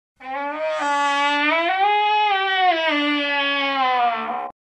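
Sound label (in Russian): звук двери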